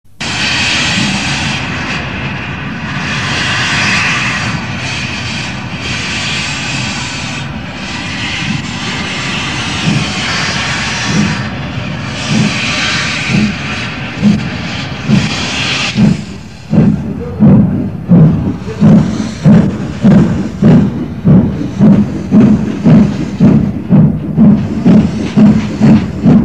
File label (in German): Zischen; alsdorf